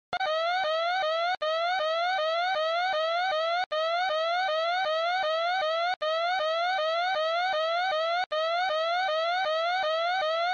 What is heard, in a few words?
alert; android